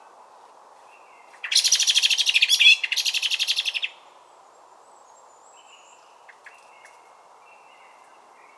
Amsel Alarmruf

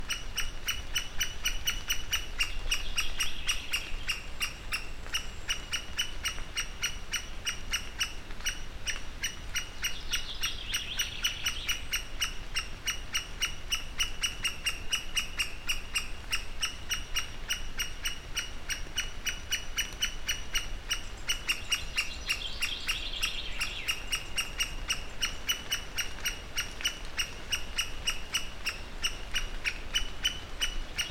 Buntspecht Alarmruf

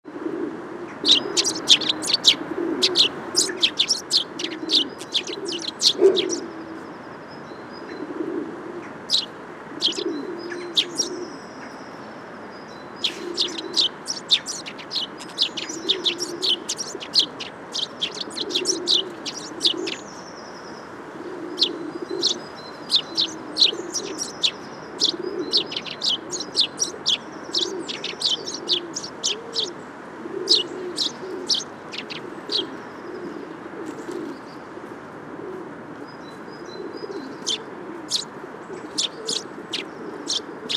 Feldsperling Gesang

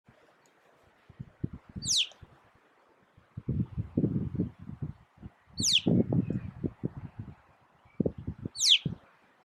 Alpendohle Stimmsruf

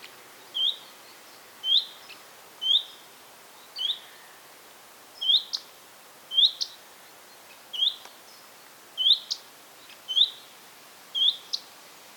Gartenrotschwanz Ruf

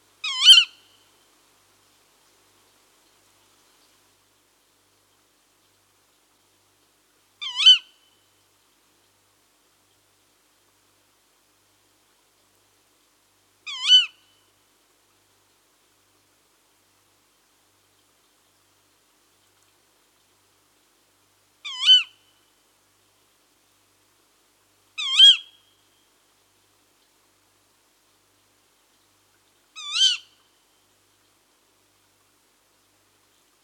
Waldkauz weibchen

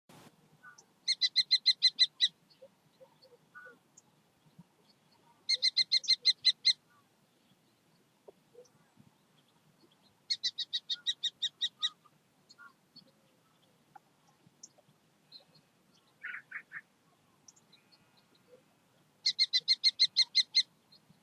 Turmfalke Ruf

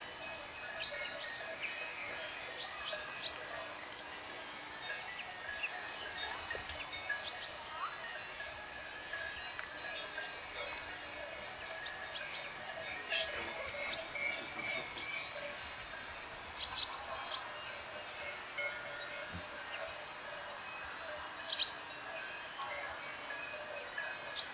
Sommergoldhähnchen Ruf

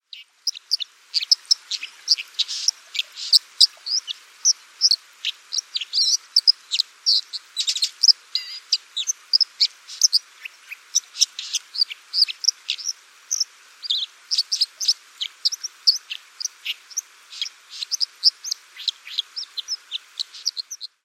Wasseramsel Gesang

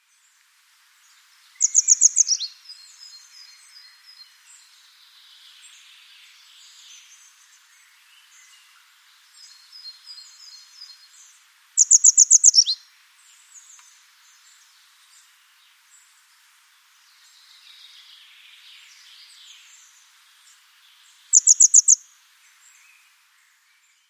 Bergstelze Gesang

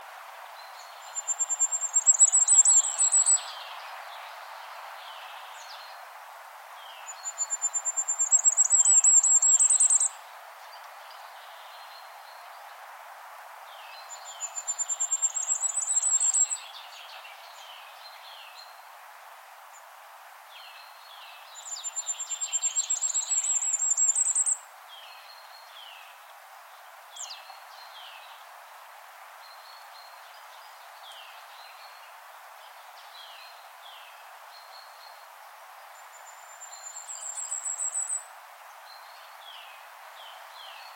Sommergoldhähnchen Gesang

